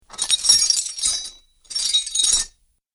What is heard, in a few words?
Metal Chain Sounds; Metal Sound Effects; Chains Sound Effect - Clink, Clatter, Clang, And Clink Are The Sounds That These Chains Make.